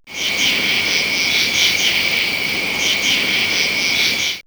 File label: Nature, Nighttime; X-Static Goldmine